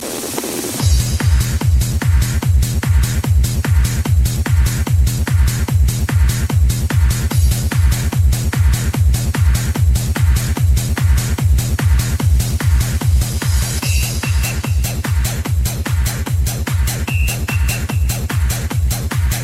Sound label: Techno Bass